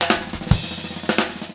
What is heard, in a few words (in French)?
Batterie son; Ecole de musique